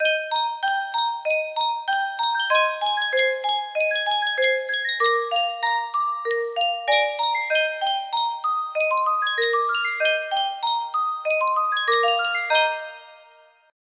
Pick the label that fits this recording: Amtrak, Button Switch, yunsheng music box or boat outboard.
yunsheng music box